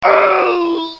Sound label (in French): Cri ouuuh; bruitage